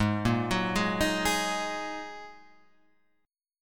Ab 7th Suspended 2nd, down, Guitar, Half Step Down; Ab7sus2; chordshape